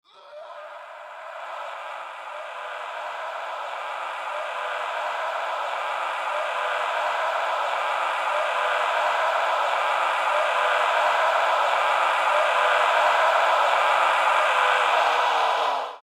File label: VOCAL SCREAM, Scream Pan Delay Reverb; Monster; Monsters; Production Element Imaging Element Accent Transition